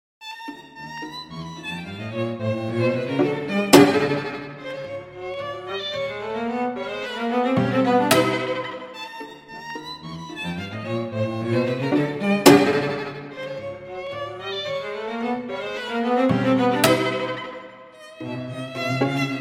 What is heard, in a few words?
Microtonal String Quartet Andantino Giocoso